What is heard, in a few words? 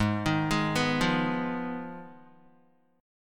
Ab Minor 6th down, Guitar; arpeggiated; JGuitar